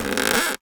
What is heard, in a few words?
Polystyrene Creak